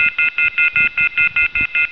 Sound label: offhook